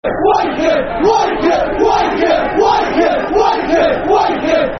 Wanker, Wanker [Football Chant (Soccer Songs)], Chesterfield